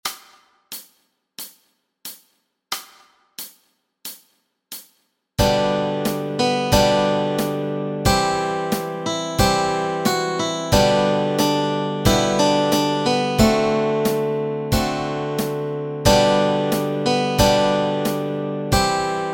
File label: Deep and Wide melody and chords